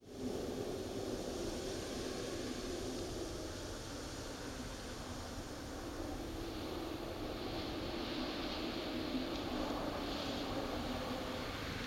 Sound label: wind; OneShot Fading Memory